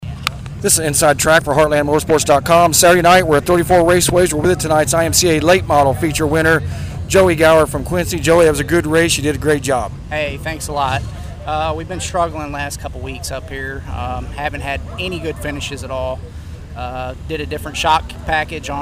Raceway IMCA Late Model; Quincy IL; West Burlington, Iowa; 5-26 34 Raceway; Interview from the 34 Raceways May / 26, / 2012

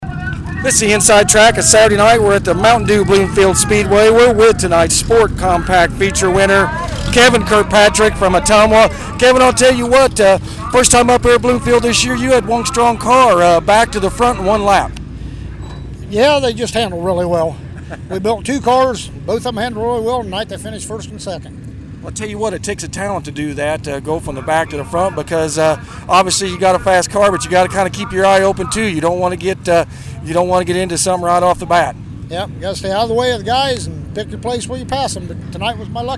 Sport Compact; 5-5 Bloomfield; Speedway - Bloomfield, Iowa / May 5, 2012

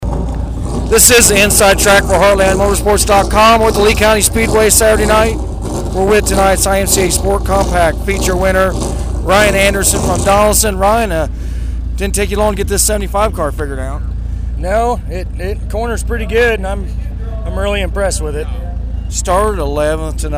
IMCA Sport Compact; Donnellson, IA; Lee County

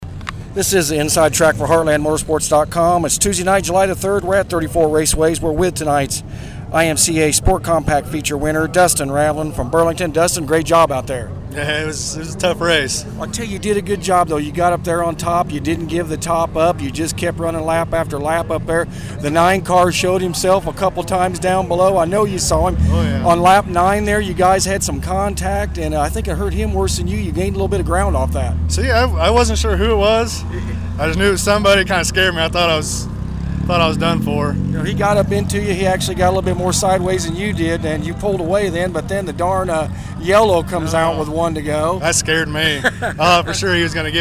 IMCA Sport Compact; Burlington

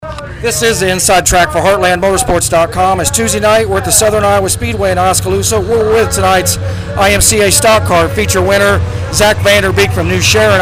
IMCA Stock Car; New Sharon; Osky

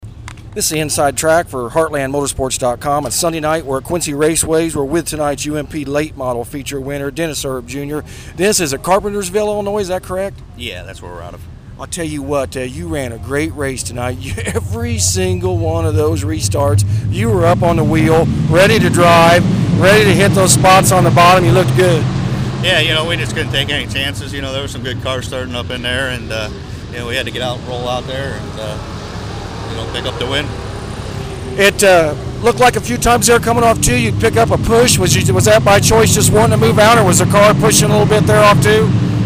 Raceway UMP Late Model; Quincy Raceway - Quincy, Ill; Carpentersville IL; Interview from the Quincy Raceways May 6, / 2012

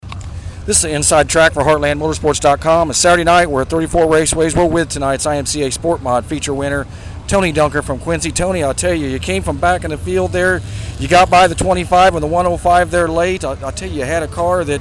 IMCA Sport Mod, Raceway; Quincy; 5-26 34 Raceway

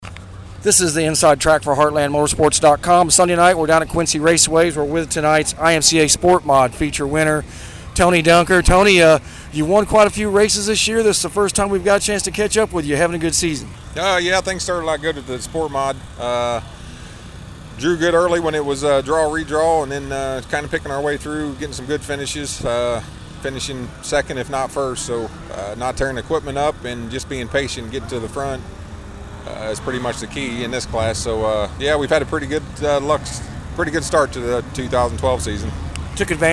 IMCA Sport Mod; Quincy Raceway - Quincy, Ill

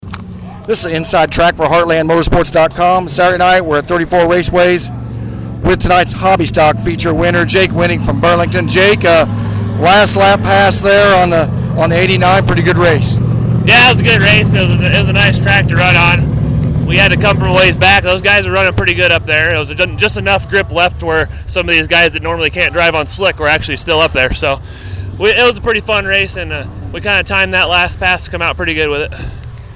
Hobby Stock; West Burlington, Iowa; 5-26 34 Raceway Hobby Stock; Interview from the 34 Raceways May / 26, / 2012

